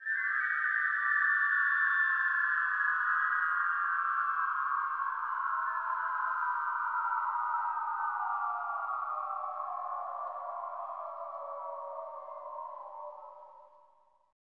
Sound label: CHO WHIST, CHO Whistle; Sound & Vision - Gigapack I CD 2 (Roland)